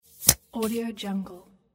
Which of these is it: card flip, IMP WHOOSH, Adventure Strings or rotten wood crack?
card flip